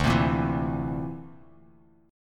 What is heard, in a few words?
D 7th Suspended 2nd Sharp 5th D, Bass Standard; D7sus2#5 strummed; JGuitar